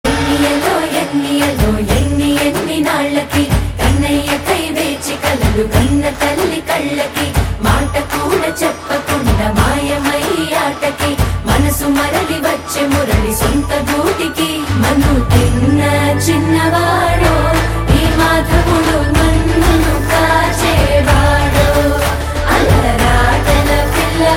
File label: Telugu Ringtone